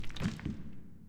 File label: Footstep; FMOD Project; CaiStuff; Player